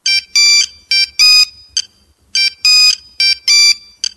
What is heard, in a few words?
Nokia New Sms